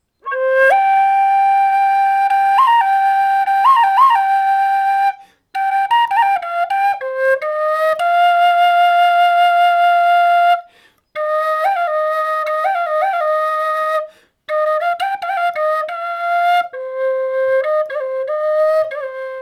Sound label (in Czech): Siyotanka Cis